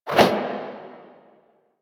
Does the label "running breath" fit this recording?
No